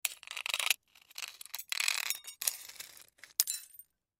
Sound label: Crash Glass Debris Impact; Crash Glass Debris Step On; Debris (Sound Effects:Materials); Blastwave